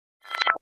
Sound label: Chatter Fade Noise Out, stinger